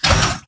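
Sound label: Stalker Movement Servo Heavy Short; Titanfall 2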